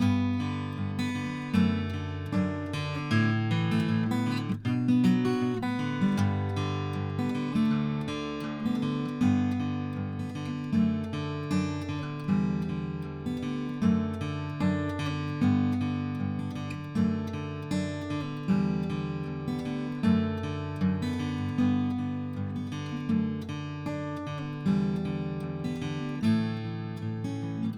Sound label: guitar